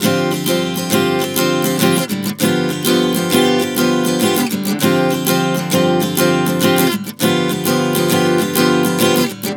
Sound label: Dm7-G7 100 bpm, acoustic guitar chord progressions